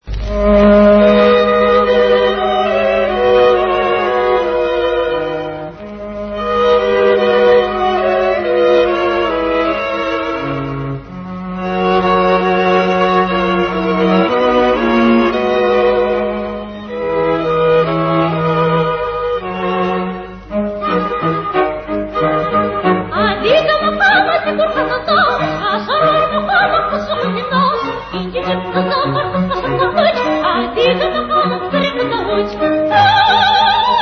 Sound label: Circassian Melody